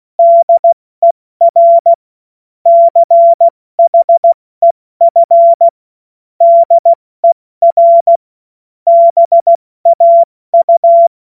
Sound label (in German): Witz, Morsekurs, cw morsen